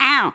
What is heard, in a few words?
Au; Elevation